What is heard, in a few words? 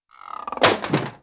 impact crunch; BRYTLJUD